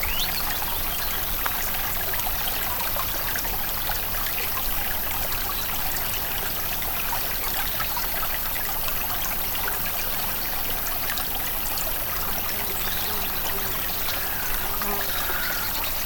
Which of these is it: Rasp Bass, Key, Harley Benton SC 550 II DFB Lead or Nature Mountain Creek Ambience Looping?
Nature Mountain Creek Ambience Looping